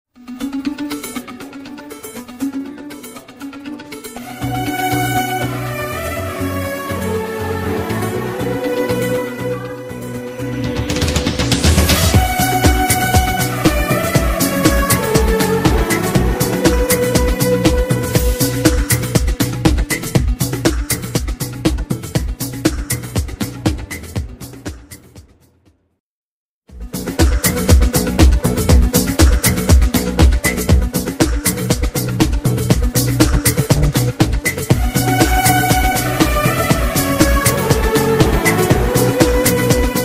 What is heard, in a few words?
bgm